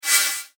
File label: Laser Scythe Chop, science fiction sound effect